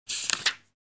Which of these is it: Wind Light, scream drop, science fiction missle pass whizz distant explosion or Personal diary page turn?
Personal diary page turn